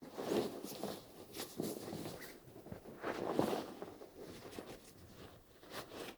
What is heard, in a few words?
jacket rustles; human; NS Studios - ultra sound collection